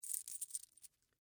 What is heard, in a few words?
Jewelry Bracelet, Take, Off, Large; Foley, Jewelry; Dressing